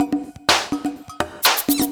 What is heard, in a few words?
DRM, LOOPS 125, House